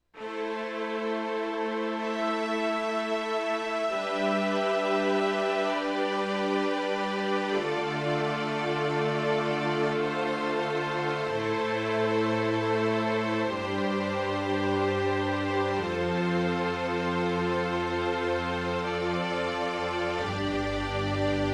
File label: Strings; Prodipe